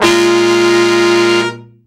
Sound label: BRA SECT; BRA SECTIONS